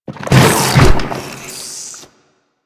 Infested Ancient Strike Flesh; Enemies; Warframe

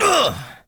Injured Grunt

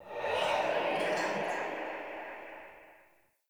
Transmission Out; Fenn Jotar; Transmission In Outs

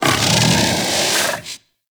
Kubrow Armored Vocal Breath Walk; Warframe

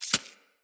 Arachnoid Micro Step Snow; Enemies; Corpus

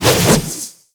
Dodge Whoosh; Warframe; Wraith

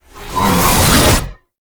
Cast Riser; Warframe; Uriel; Remedium

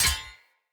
Short Sword Impact Parry Sword; Weapon Impacts; Enemy; Swords; Sinecure Short Sword

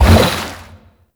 Aqua Board Jump Charge Ready; Warframe; Yareli

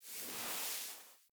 Idle Stand; Kubrow Foley; Warframe

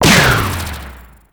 Sickle Heavy Combo; Warframe; Lasrian Soldiers; Weapons; Lasrian Butcher Sickle

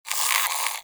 Rodent Vocal Snore; Warframe; Hunting Critters; Characters

